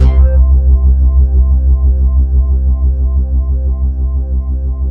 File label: SYN BASS